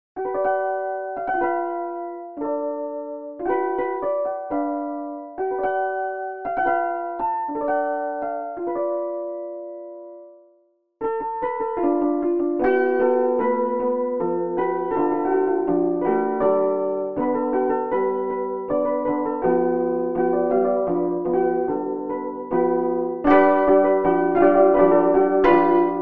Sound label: yulung tuned; Xenharmonic Alliance